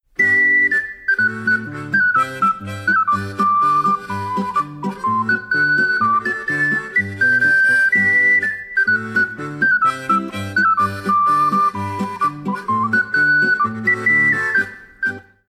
Okarina Polka

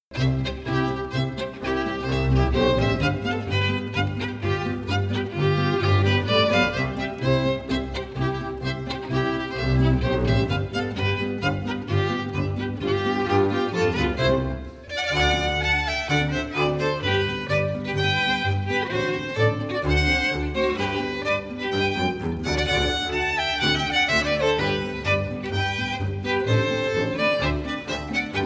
Marsch; Zepperl Marsch

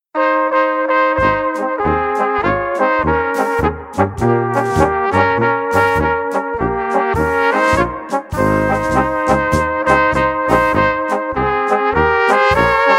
Polka

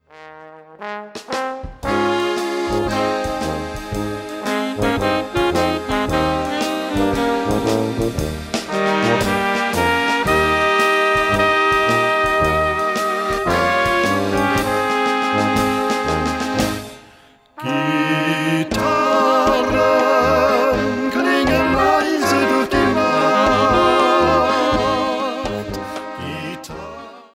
Gitarren klingen leise durch die Nacht

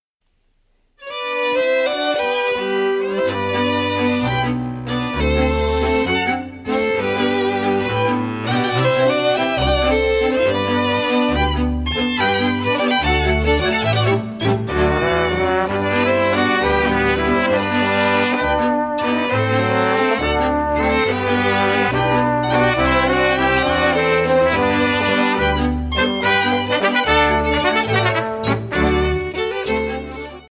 Walzer

